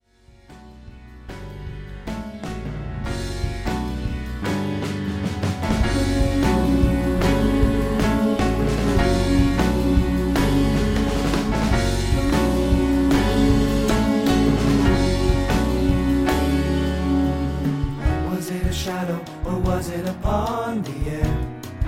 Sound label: Move Ensemble